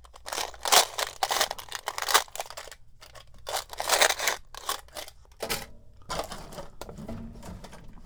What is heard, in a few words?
Shake Nail Box